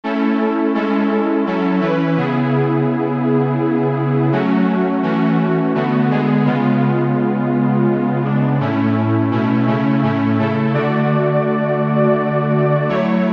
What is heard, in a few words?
Lullaby